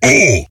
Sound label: pain; Deadlift